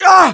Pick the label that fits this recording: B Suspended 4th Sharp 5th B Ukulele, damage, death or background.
damage